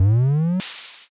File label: compressor